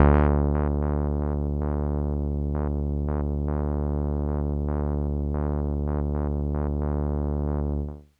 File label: Kawaii D-1; Randroid